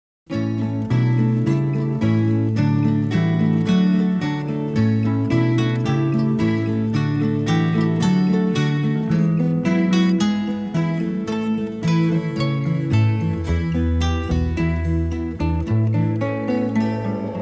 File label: Instrumental